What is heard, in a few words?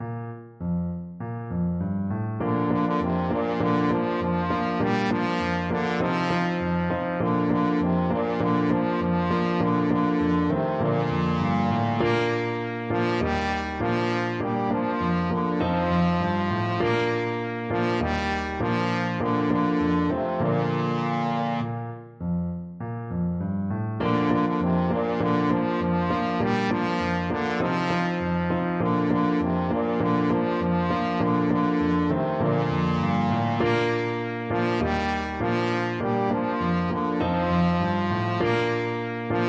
liza, trombone